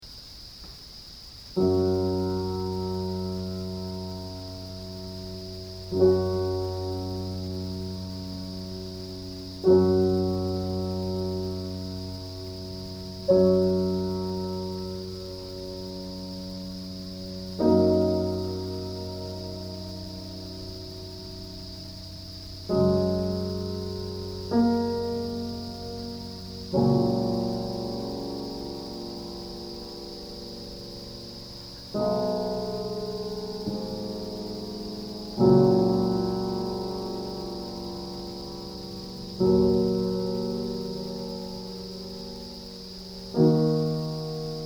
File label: piano sample